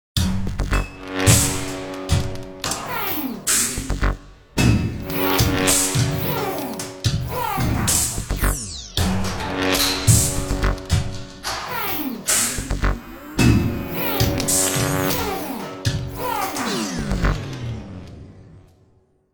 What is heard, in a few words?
Electricity videoringtone; Nokia; Audio themes